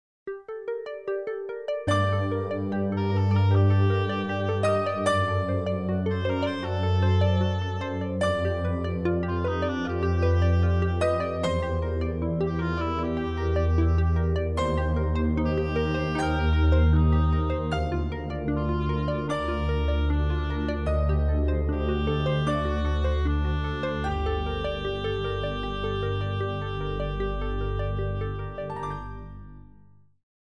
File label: Easy Listening & Classical; Sound Ideas